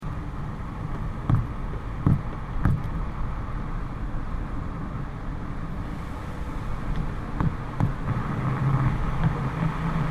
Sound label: car tyre noise accel decel catseyes stereo